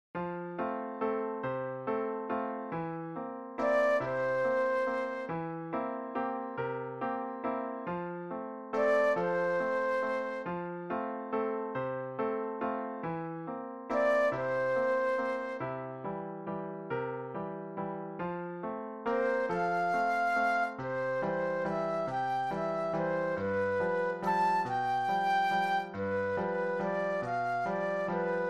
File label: Flute Solo